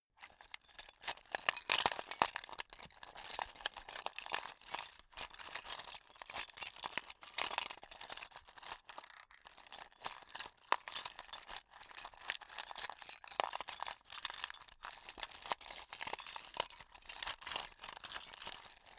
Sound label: sundries rummage; soundpool